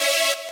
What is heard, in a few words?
saw